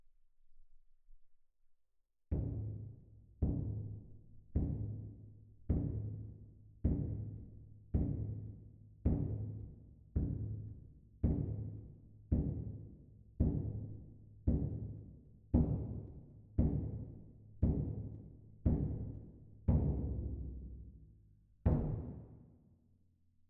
Perc

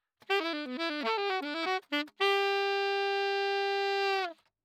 Sax

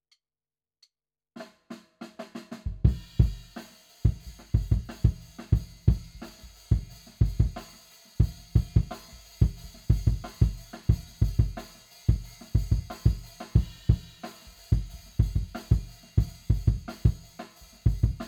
Kick IN

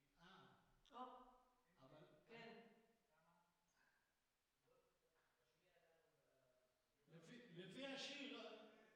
DRUM VERB

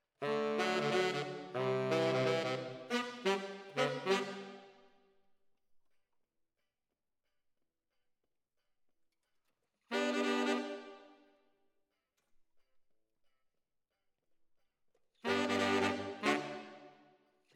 ORTF SCHOEPS, piano

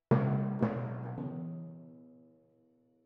Twin F Timp

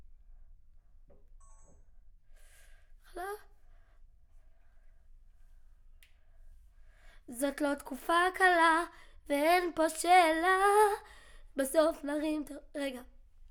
Children Choir; Studio Bekol Children Choir

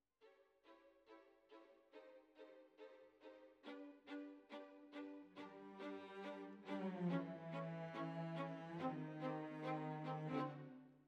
Violas, jazz for piano

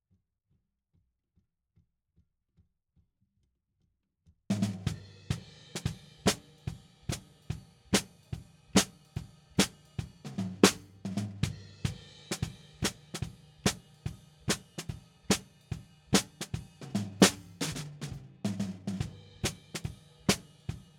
SNR BTM

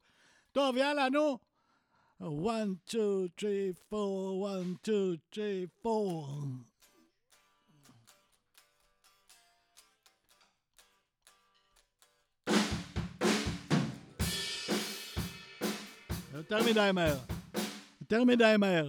VOC

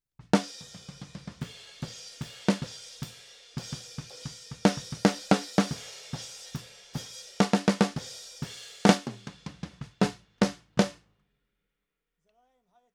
Snare

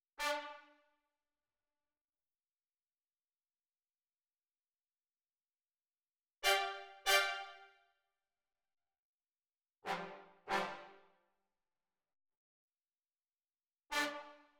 brass

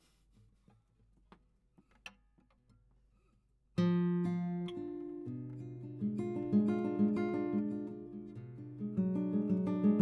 GTR

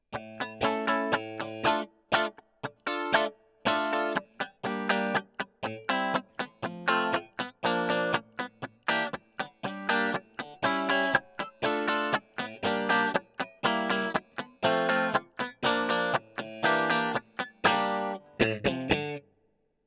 E GTR; Gefell

